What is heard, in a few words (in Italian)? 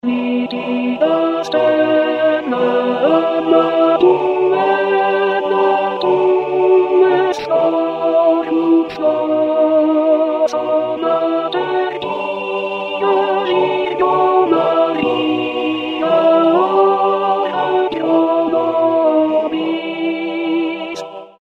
contralti